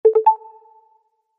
alcatel skip ringtone, nokia sms ringtones

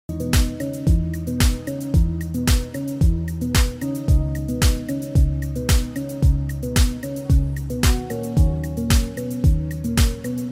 iphone ringtone; fruit passion

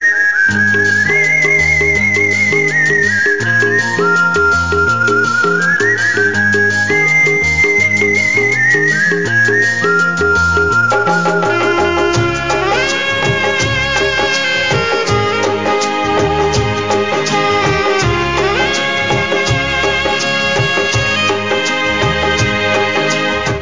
whistle instrument ringtone; maine pyar kiya

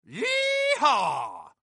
very cute short message tone ringtone

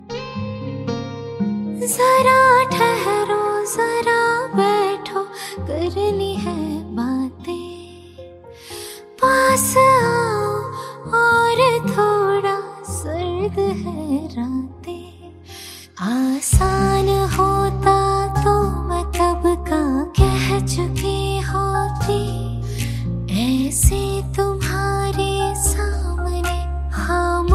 hindi ringtone, bollywood

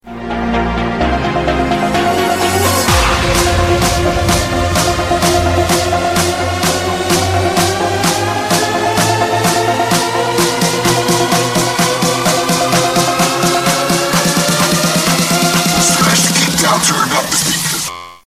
trance ringtone; tomorrowland

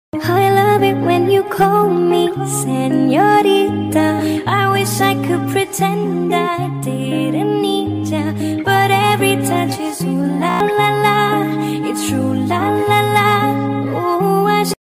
most romantic english ringtone

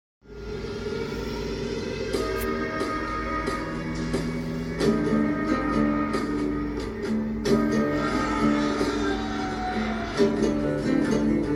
games music tone ringtone

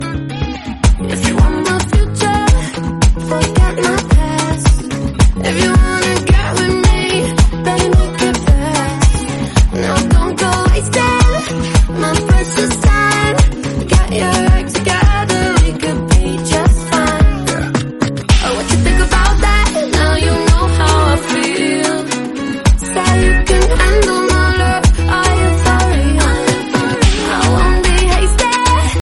dance music tone ringtone

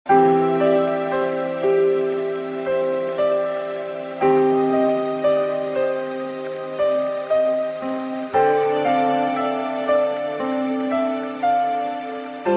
soothing music tone ringtone

